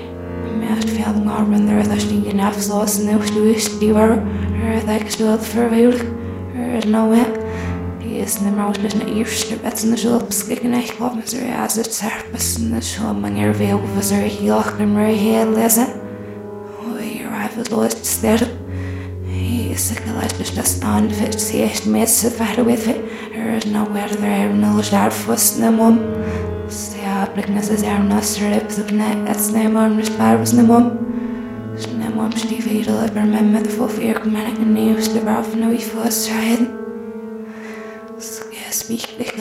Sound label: Music Loop